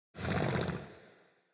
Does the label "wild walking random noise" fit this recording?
Yes